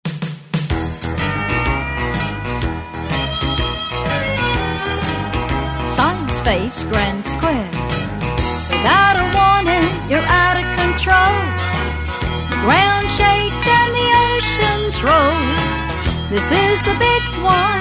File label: vocal